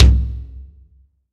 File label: BD, BASS DRUMS; EdgeSounds